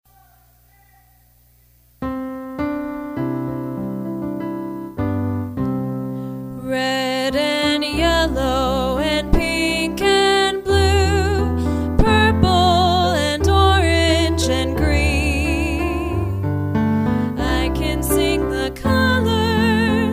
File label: vocal